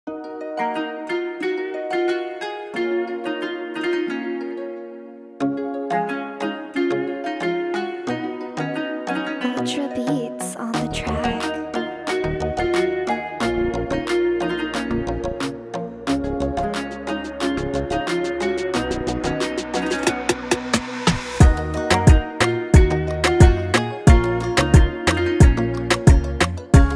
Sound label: arabic oriental reggaeton type beat instrumental